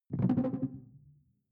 sci-fi alien bounce; swooshes-swishes

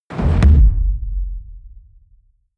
heavy sub noise hard; whooshes rises; hits impacts

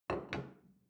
plate place table soft; soundpool

